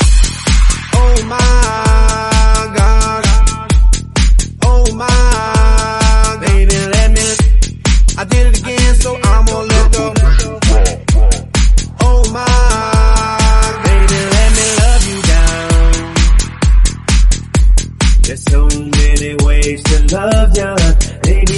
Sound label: 130bpm Em